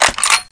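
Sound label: pickup, weapons; SINDEMO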